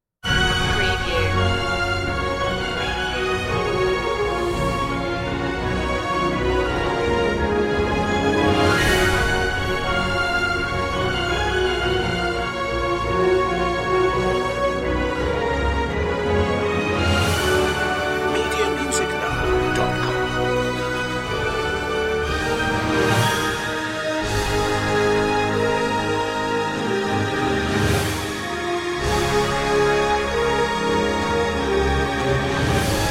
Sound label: theme to finale no choir